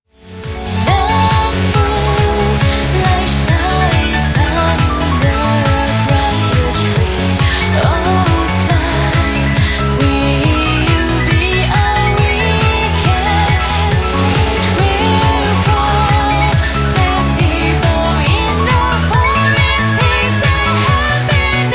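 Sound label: background music crossfade; Invaxion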